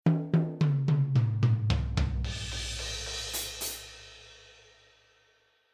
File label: FELT MALLETS